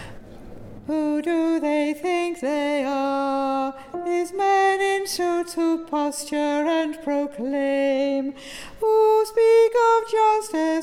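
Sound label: Alto Tune Unison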